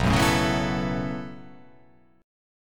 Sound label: B 7th Suspended 2nd Suspended 4th down, chord